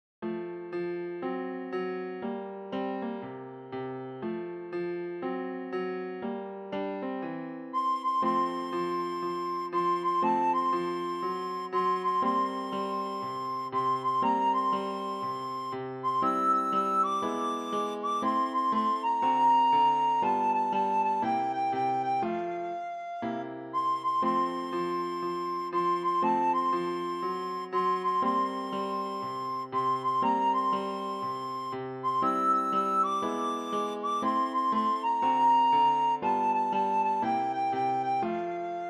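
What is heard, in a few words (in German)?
Blockflöte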